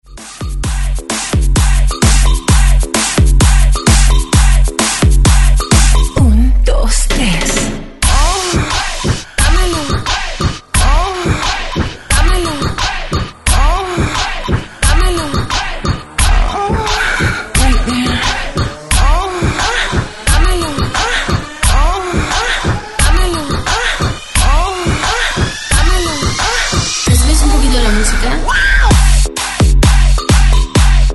130 BREAKMIX SMASHER

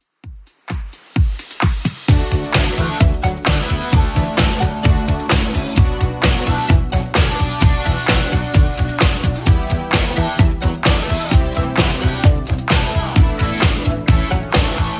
80s club 130 bpm clean

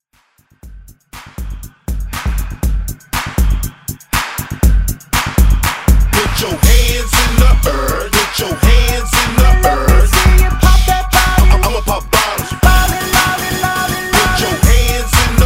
Throwback Redrum

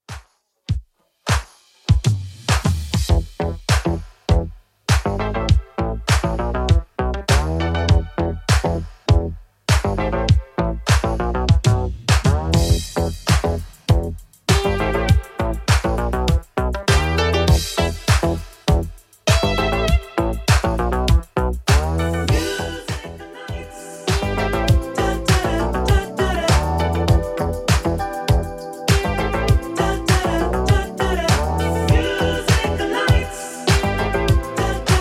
80s Redrum 100bpm

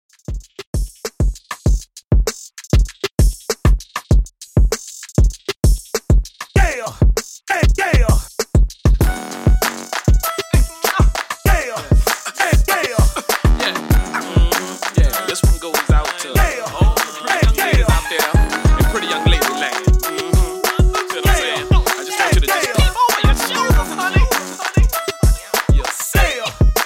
Old School Redrum 98Bpm

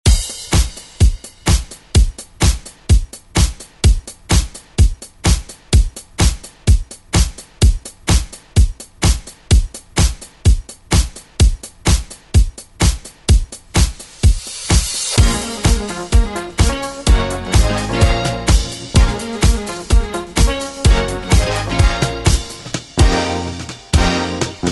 70s Re Drum; DJ Mhark

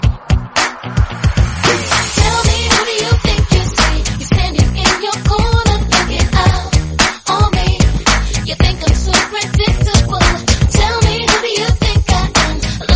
112bpm Abm

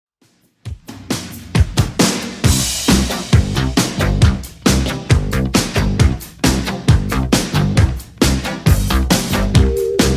80s Redrum 135bpm

